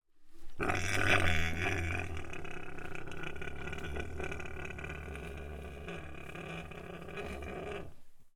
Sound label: Pig Squeal; Coll Anderson